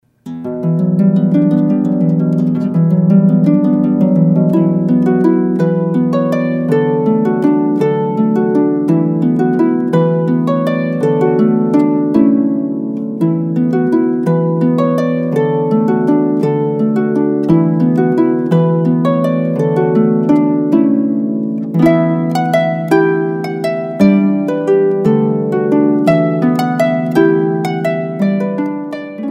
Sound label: Harpist